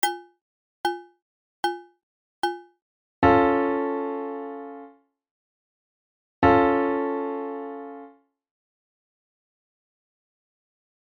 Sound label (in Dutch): drieklanken